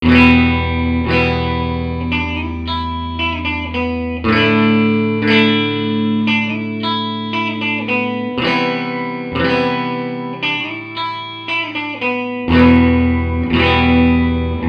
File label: Gtr Amp in a room